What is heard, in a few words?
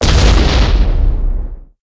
explosion; Alawar; Crusaders Of Space 2